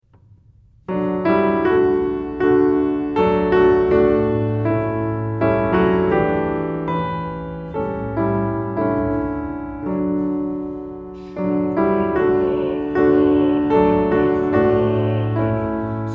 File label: Piano; Children Of The Heavenly Father; piano accompaniment pieces